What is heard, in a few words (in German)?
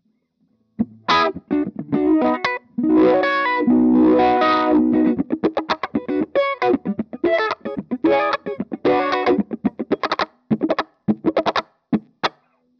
Depth auf 0 Bass